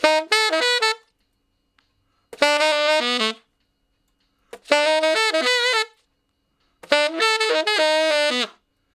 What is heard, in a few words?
Ten Sax Straight (B), R&B; Solos